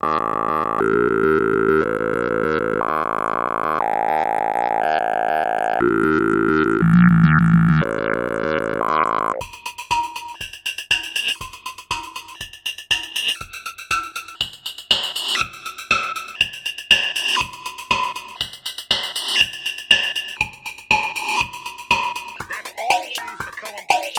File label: formant